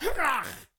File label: Goblin Injury; SyrinScape